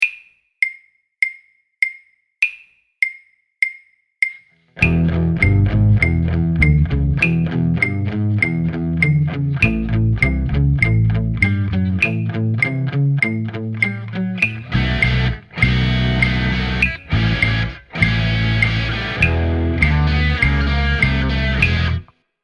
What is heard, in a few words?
Hold The Time Practice Mix, drum kit